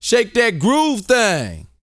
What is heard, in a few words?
VOCAL F; ILIO